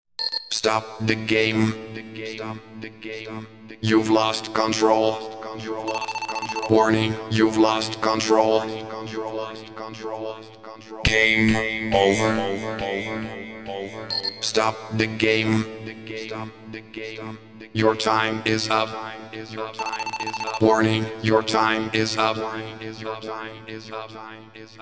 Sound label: acapella